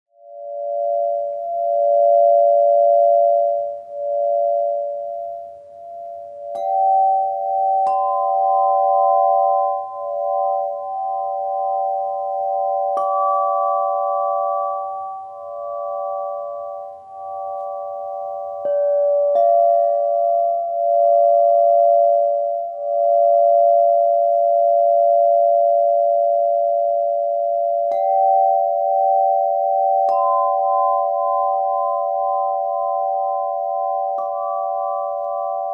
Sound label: Celtic Moon Full Moon, crystal harp